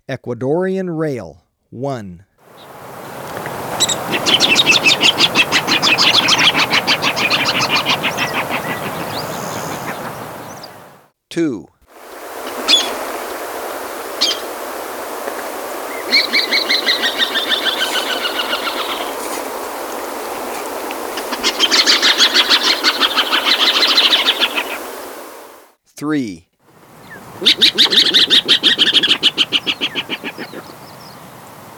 Ecuadorian Rail; S. America

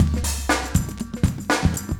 chique 120, loops, 120bpm; Sound Effect Library

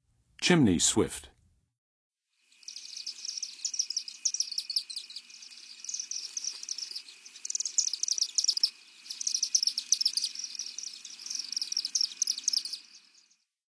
Chimney Swift, Songs of Birds of North America; Western USA; Western USA Songbirds

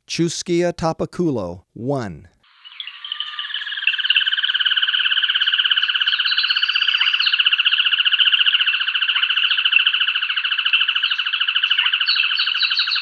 Chusquea Tapaculo, Birds of Ecuador; S. America

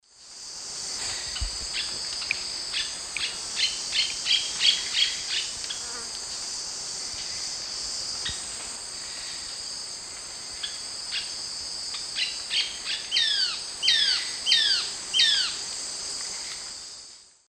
Mionectes oleagineus, calls; S. America; Eastern Lowlands

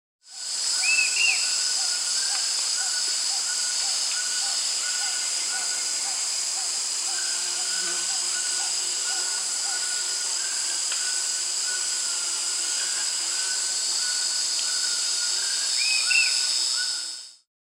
Yellow-crowned Tyrannulet; Tyrannulu; S. America

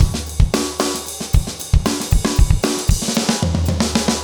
urban grooves 110

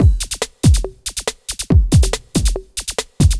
techno 140

